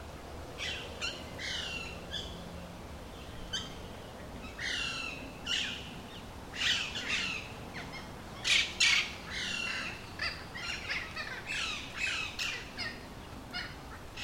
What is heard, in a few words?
Parrots